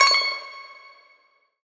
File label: tonal oneshot C#; Pop; Slate; PASADENA Pop Sample Pack; Tonal One Shots